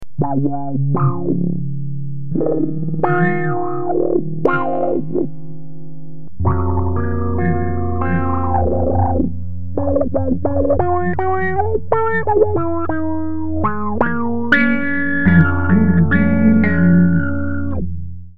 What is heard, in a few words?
env Ripple